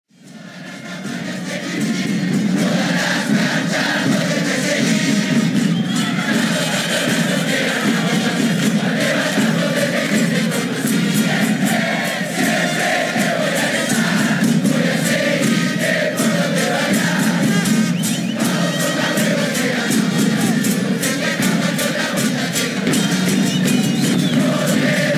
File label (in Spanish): Todas las Campanas